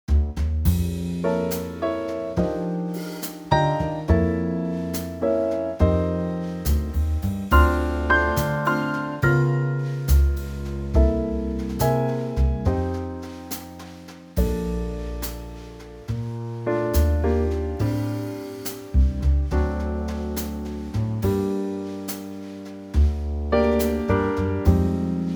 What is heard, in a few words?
Bb Trio